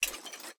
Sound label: minion armor; smite; npc; roman archer